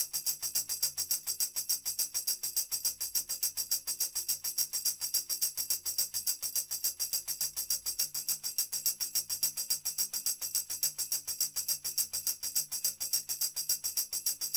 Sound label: Tambo; Tambourine